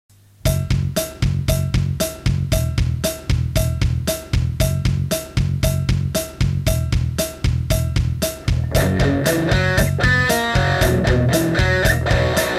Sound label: two chords are enaugh